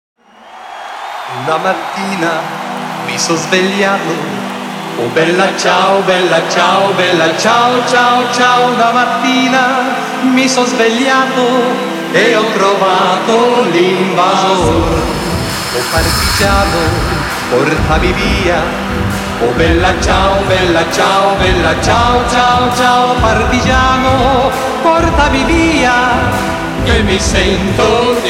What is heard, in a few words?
Remix (Electro House)